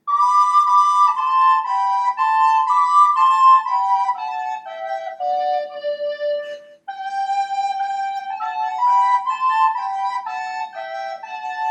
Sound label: Duet, The Streets of Laredo